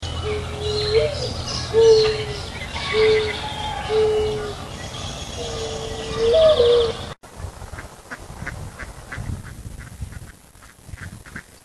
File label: Bird Calls